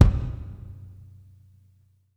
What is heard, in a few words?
GATED VERB, KICK DRUM; Sampleheads; New York City Drumworks VOL-1; SP KICK DRUM